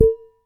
Damped Hit, Glass, Large Wine Glass

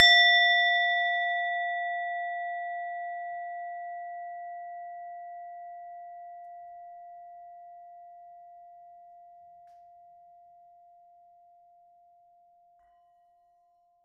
Hard Strike, Small Bowl, Tibetan Singing Bowls; Percussion Samples

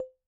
Bottle Struck

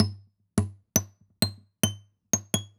Plink, Classical Guitar Extended Technique

